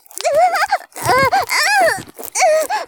絶頂, ASMR; おまけ(絶頂+潮吹き+中出し ランダム再生)

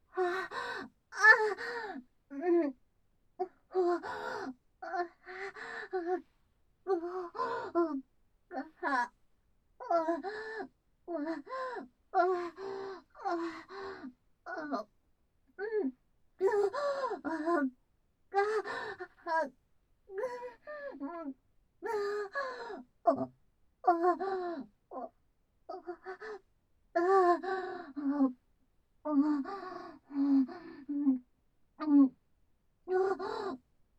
バックで突かれる

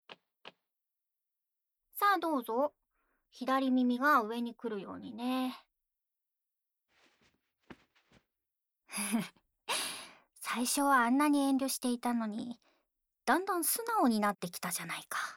実験の前に、まずはケアから（耳かき・左耳）, ASMR; お耳ジャンキーな理系JK

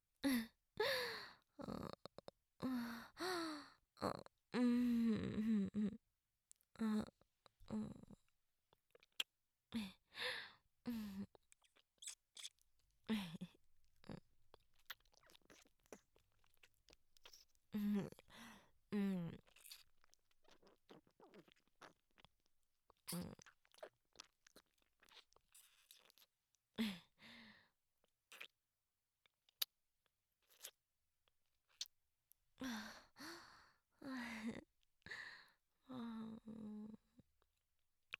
キス; マゾハーレム

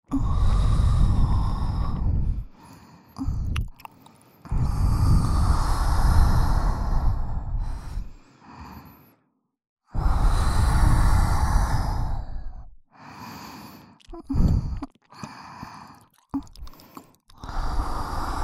両耳元くちゅくちゅハァハァ; エミィ

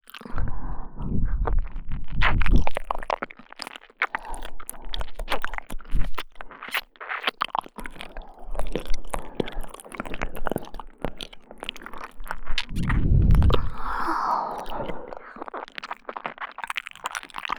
耳舐め; バツイチお姉さん; 耳元に感じる鼻息吐息

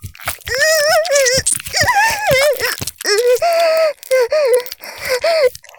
絶頂 中出し; 絶頂+潮吹き+中出し ランダム再生

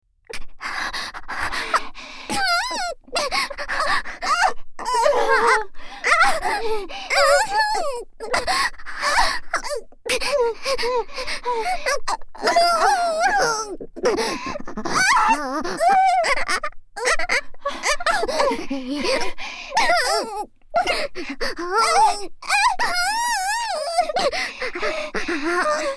潮吹き スパンキング ループトラック, ASMR

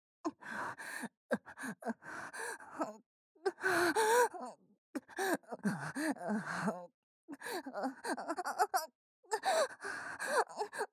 右 喘ぎ; ヒルダ; 【ダブル低音オホ痴女】欲求不満な女軍人たちは堕ちた異世界男娼の耳奥犯して永久搾精したい

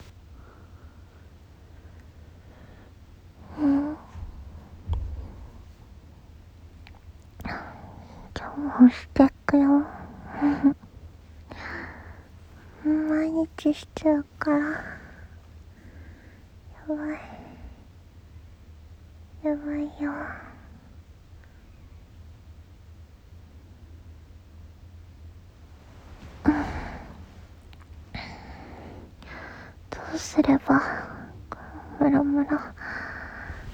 現役JDおなにー; 現役JD実演オナニーボイス。〜演技なしオナニー詰め合わせ〜

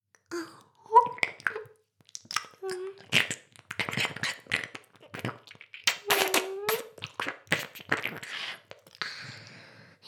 耳舐め, ASMR; 全妹計画２; メスガキ妹; 全妹計画<オール・シスターズ> 2妹め

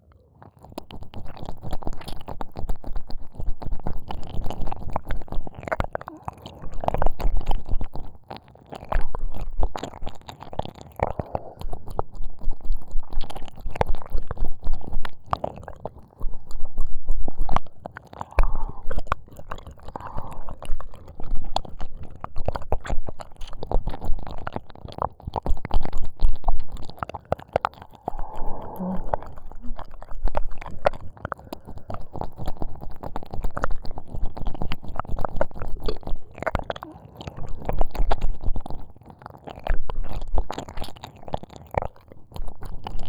耳舐め, ASMR; シホ; 怖ギャル

